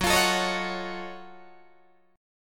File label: Gb Minor Major 13th Gb down Guitar DADGAD; strummed